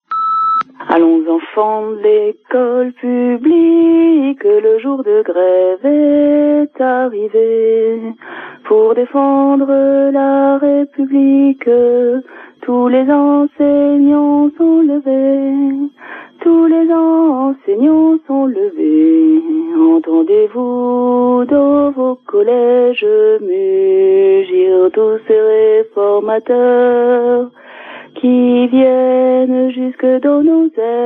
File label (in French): Manif retraites chanson repondeur